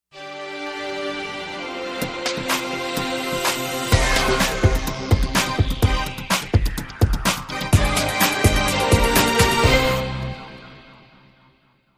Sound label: News Beat Short Cue